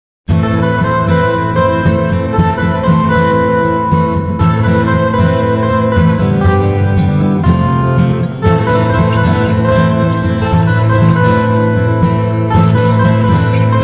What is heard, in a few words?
Instrumental